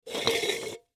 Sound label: Can Slide Soda, Can Slide Full; Slide Sound Effects; FOLEY